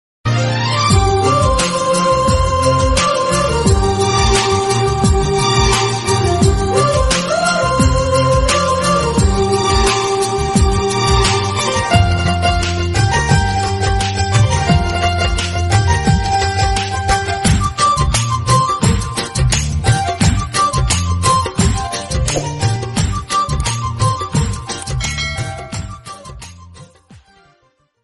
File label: love bgm ringtone, telugu movie bgm; Jayam